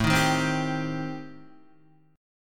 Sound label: A-Minor 7th Flat 5th, Guitar, chord